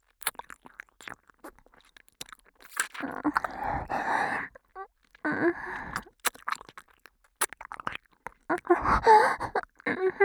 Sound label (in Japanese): 右耳舐め, ASMR